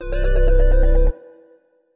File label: space debree, Synth